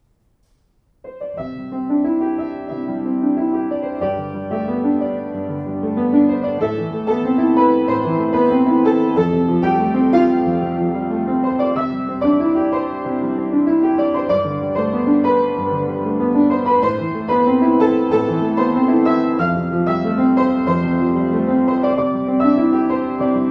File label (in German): Schimmel Klavier